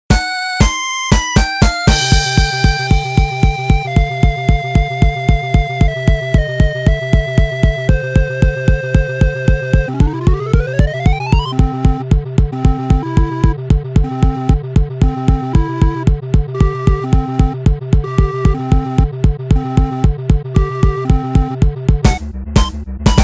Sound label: BGM, Simple rhythm